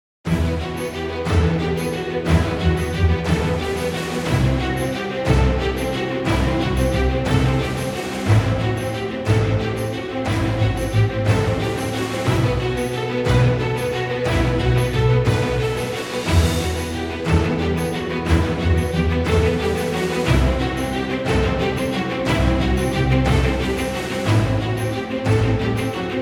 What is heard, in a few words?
Epic Trailer Background Music